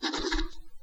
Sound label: sign contract; gmod